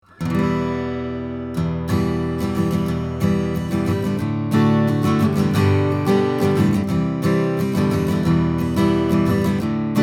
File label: Acoustic Git 40cm 15 Fret